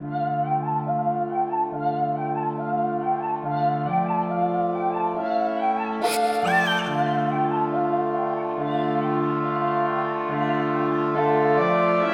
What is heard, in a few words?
snap a dmin